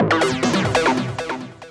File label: TECHNO EXTRA 140